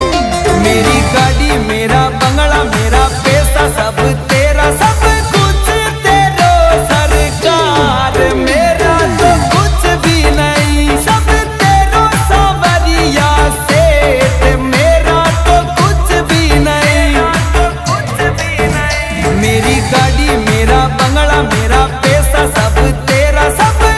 Ringtone

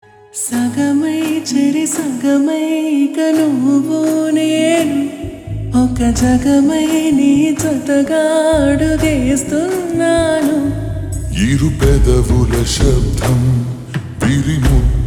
Ringtone Slowed Reverb

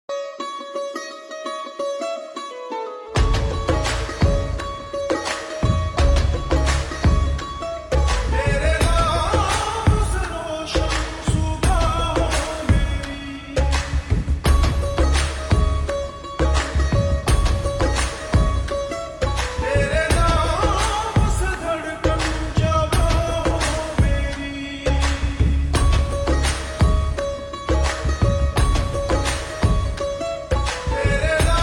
Ringtone Slowed Reverb

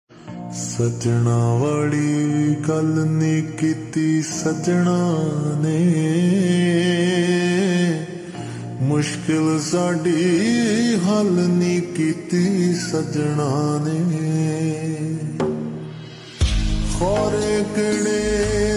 Ringtone Slowed Reverb

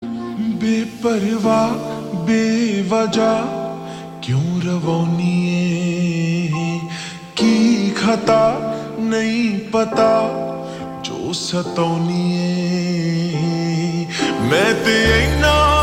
Ringtone Slowed Reverb

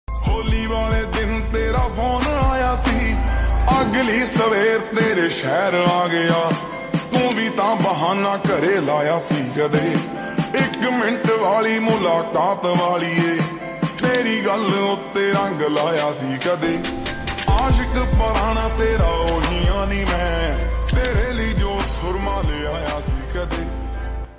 Ringtone Slowed Reverb

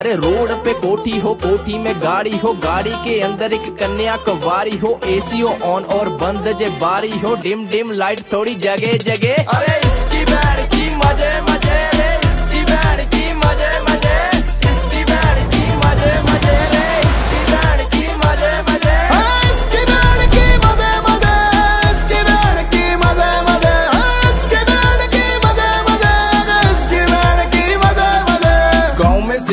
Ringtone

